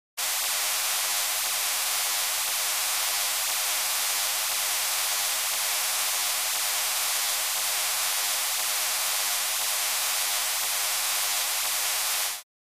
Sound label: Low Frequency Oscill, synth tones